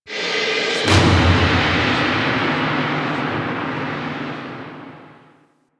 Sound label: Projections Music Loop End; Warframe